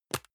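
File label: deodorant can lid open medium; haus-garten; badezimmer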